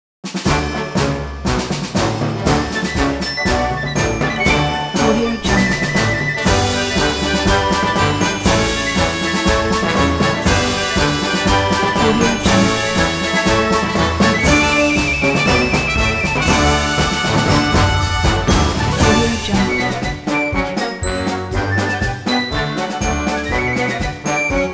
marching band at the state fair

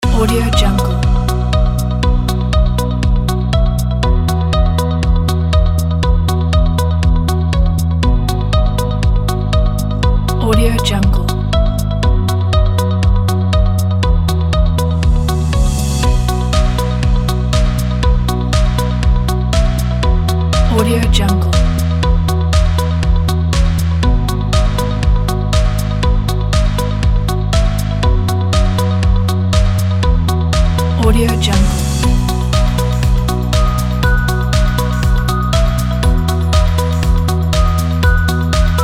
corporate background media loop

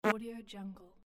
fart

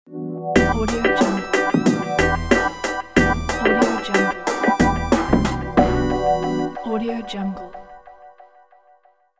shiny chill hiphop logo; eatalot

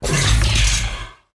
puzzle game unlock mechanical large

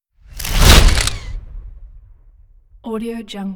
camera surreal blasting whoosh by shutter; prosoundfx

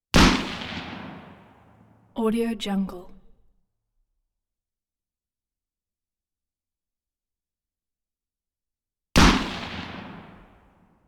m4 shots

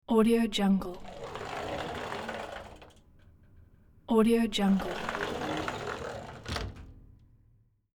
glass sliding door open and close

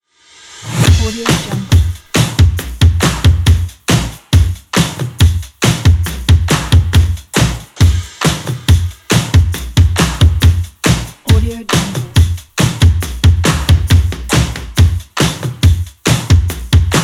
energetis and upbeat indie rock drums

